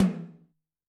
TOM Xfad H, Yamaha DTXpress-II

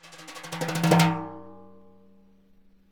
TIMB BR HI, Synclavier Percussion; Synclavier Percussion 2; World & Orchestral; ILIO

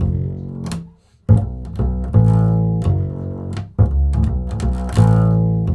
acid F#, 85 acid swing, bass; Spectrasonics; Bass Legends

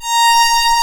Synclav FM STRINGS A#; Big Fish Audio

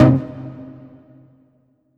STAB Paranoid Pluck; Polyend; Plughugger

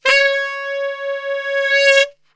C#3 SAX; Best Service; Brass Super Section

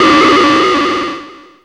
VCS BELL; ANALOG BELLS; Ambient; Zero-G; Ambient 1

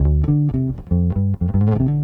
Bass, 70s Analog Funk

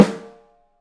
open brass, drums; 3D Drums; 3D Sound

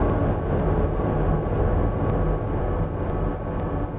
Atmos 120, sidechained

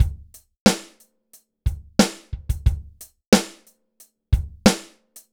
BPM 90 DRY

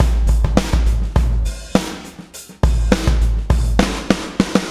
BLOW, BLOWUP

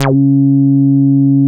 C#4, Bass; E-MU Producer Series Vol. 6 – Analogia Project; Boom da Bass

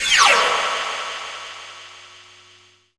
FLY-BY; Distored Reality

